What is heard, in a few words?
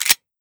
glock slide back; cstrike; ImbrokeRU